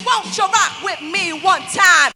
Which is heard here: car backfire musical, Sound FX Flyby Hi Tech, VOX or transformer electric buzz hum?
VOX